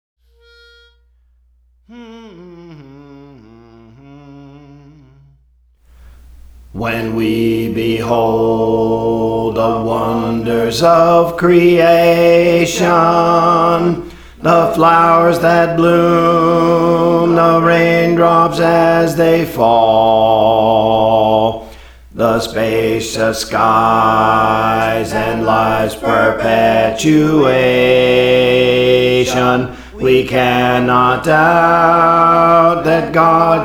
Bass, Songs; Praise For The Lord Training; Lord I Believe

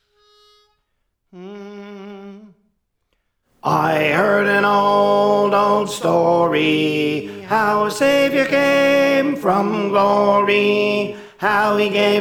Tenor; Praise For The Lord Training

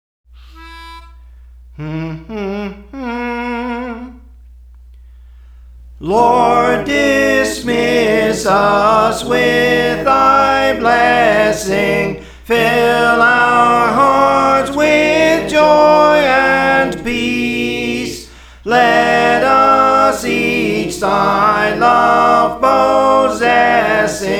Soprano; Praise For The Lord Training; Lord Dismiss Us; SICILIAN MARINERS

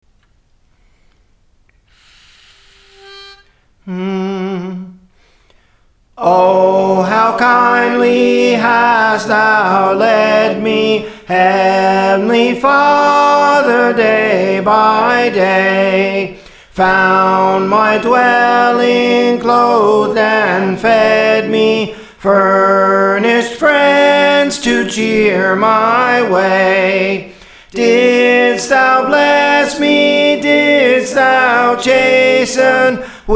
Soprano, Songs; Praise For The Lord Training

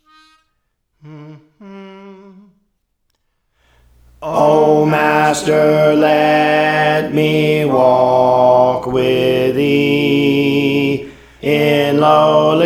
Alto; Praise For The Lord Training

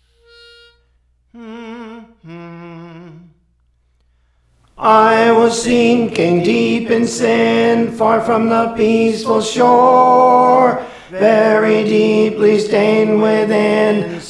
Tenor; Praise For The Lord Training; Love Lifted Me

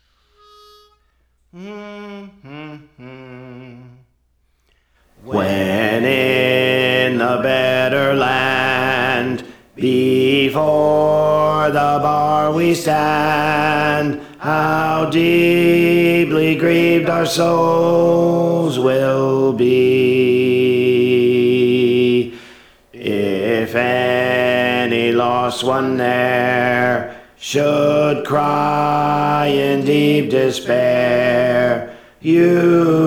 Alto; Praise For The Lord Training

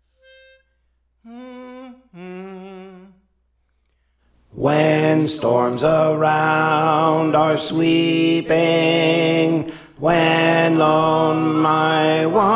Alto; Praise For The Lord Training

